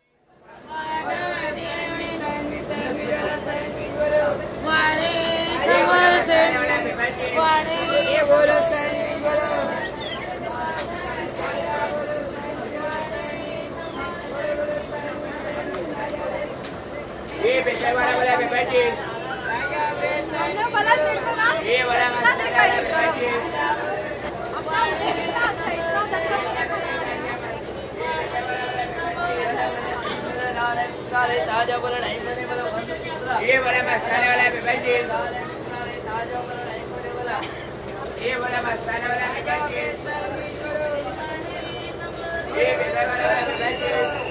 Mumbai: Suburban train, interior, waiting at station with hawkers, chat & fan, train leaves into constant run; BBC